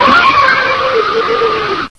demon pain

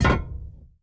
mech, player steps; quake2

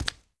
flesh, player steps; quake2

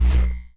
energy, player steps; quake2

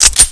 usp slide relo, weapons; svencoop

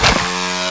saw slice; weapons; quake2

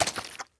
meat, player steps; quake2

